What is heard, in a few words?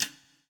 POWER RIM